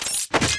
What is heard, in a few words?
ui tech use